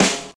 Sizzly Acoustic Snare Sample F# Key, steel snare drum tuned to the F# note